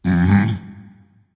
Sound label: agree dragon; fafnir